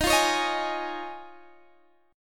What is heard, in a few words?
Eb 7th Suspended 2nd Suspended 4th Eb, Guitar Drop D